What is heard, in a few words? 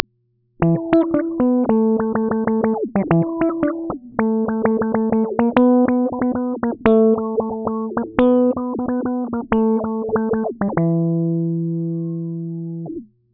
bass groove wet high Q; autowah